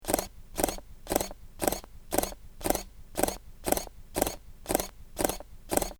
Canon EOS Rebel T1i 500D

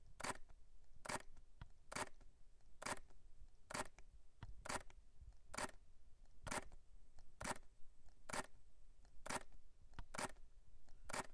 Canon EOS R6

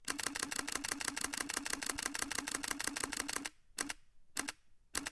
Canon EOS M100 One Shot Burst

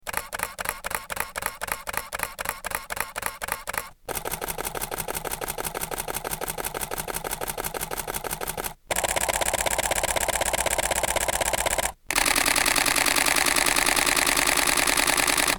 Canon EOS 5D II 5D III 7D 1D IV Burst

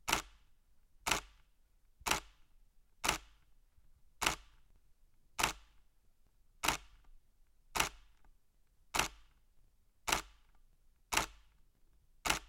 Nikon D850

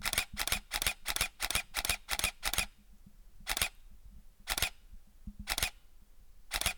Canon EOS Rebel T5 Burst

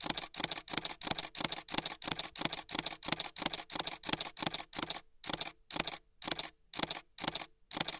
Canon EOS Rebel T6i Silent Burst

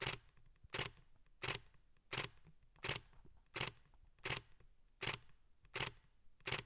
Nikon Z 6

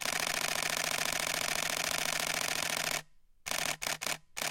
Canon EOS M6 Mark II Burst

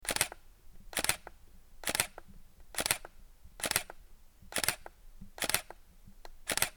Canon EOS Rebel T3 1100D

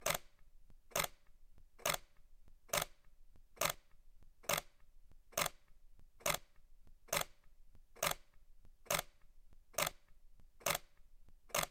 Canon EOS 1D X Mark II

